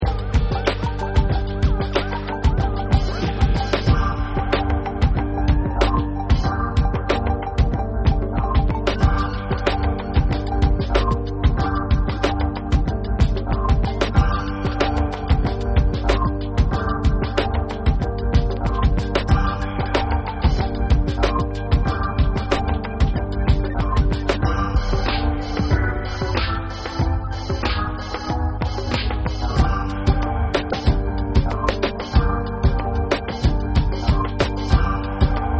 Instrumental Remix

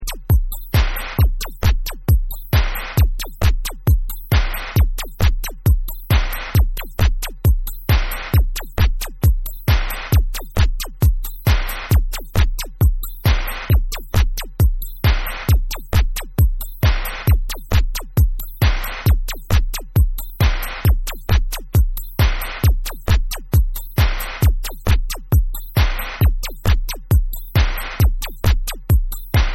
Electro Beat Dub

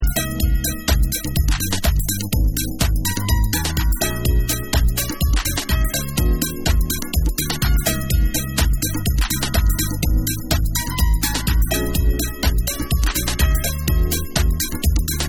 Instrumental

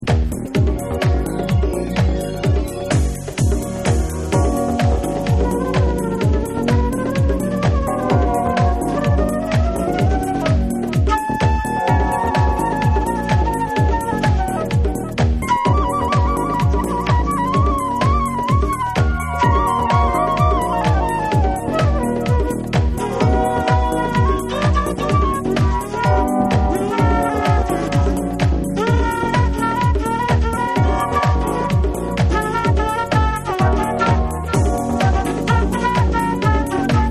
Flute Mix

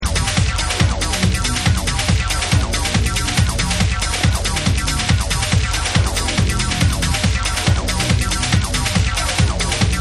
MACHINE MUSIC

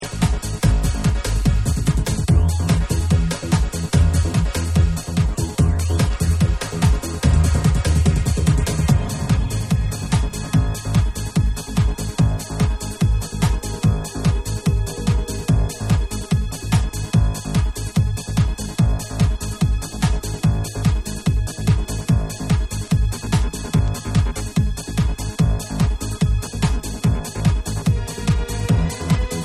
Instrumental

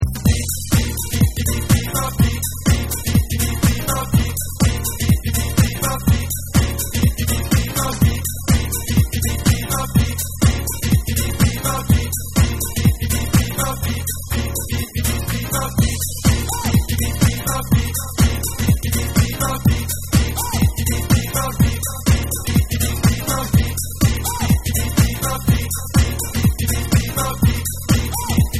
Emotive Instrumental

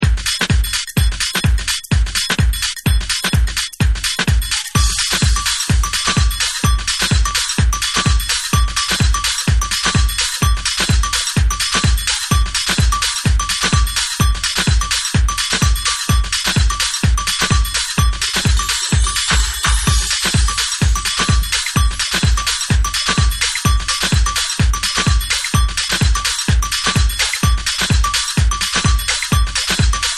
Drizzle Beats

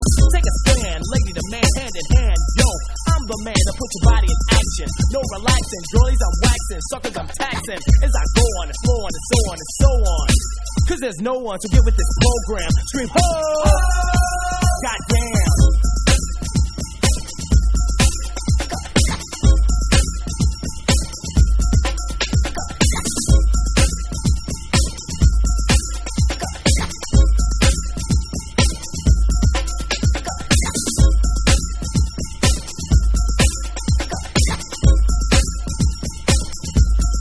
Vocal Bass Mix

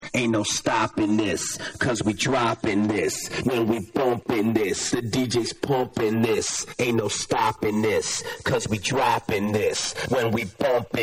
Acapella

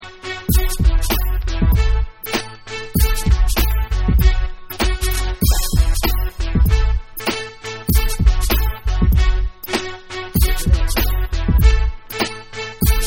Instrumental

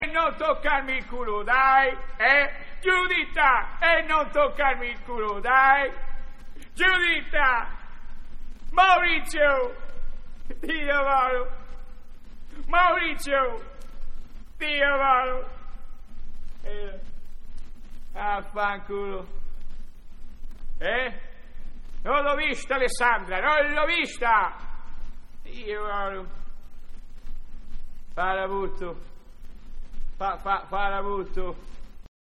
Acappella

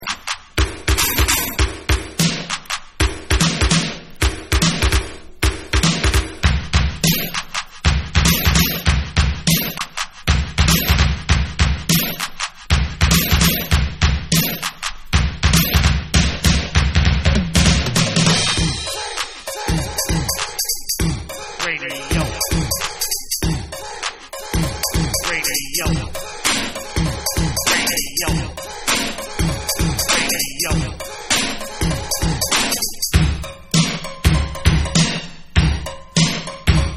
BEAT Instrumental

